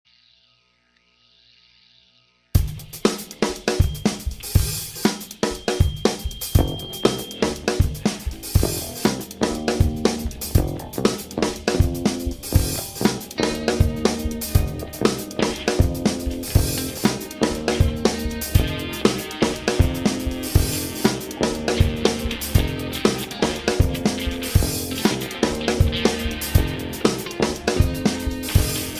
Rock Garage

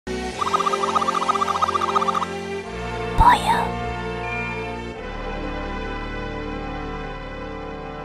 whispers; poyo

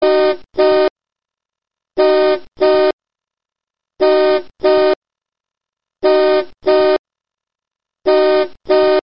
Car Horn As Ring

